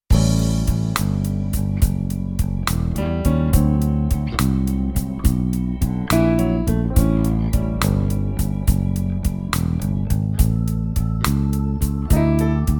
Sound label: Slow Blues Minus one